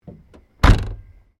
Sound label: CAR SUBARU LIBERTY EXTERIOR BOOT CLOSE; Subaru Liberty 20 01; Family members Subaru; Cars (Sound Effects:Vehicles)